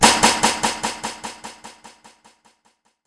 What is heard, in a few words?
surprising shock; horror